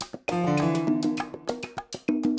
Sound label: new melody